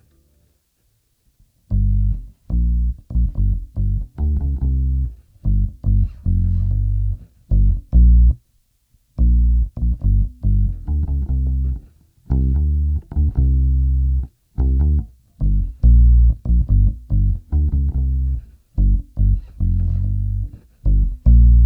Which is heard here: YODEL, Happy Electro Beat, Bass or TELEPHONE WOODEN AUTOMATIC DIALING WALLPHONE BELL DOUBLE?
Bass